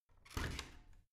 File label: washing machine open squeak